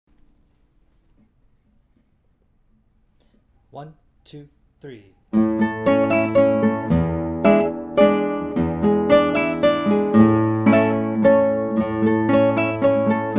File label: both guitars 112 bpm; Tarrega Mazurka; Mazurka (Duet) by Francisco Tárrega